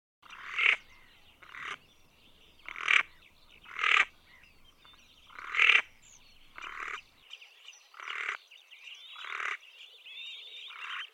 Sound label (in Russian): krasnogolovaya chernet golos